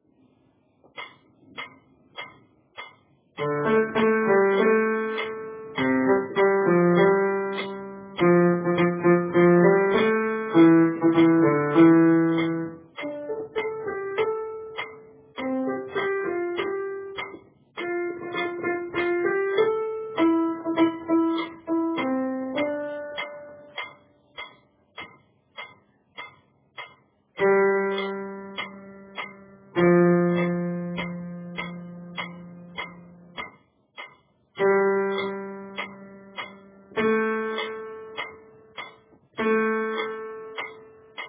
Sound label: Forgot Who I Am Bass; Accompaniments